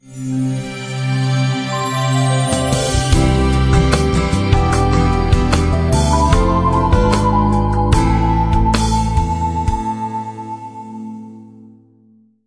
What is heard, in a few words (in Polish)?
jingiel pop